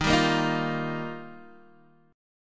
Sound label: Eb Major Add 9th, Guitar DADGAD